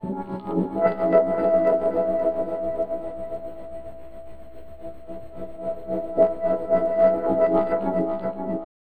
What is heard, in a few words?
Chords Tones; Equipped Music; Loungin House